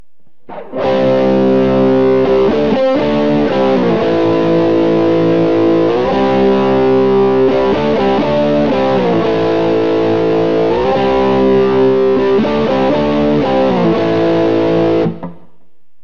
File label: neck crunch; Burny